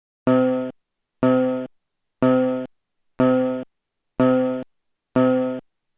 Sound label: piano note medium 25 reps; emdr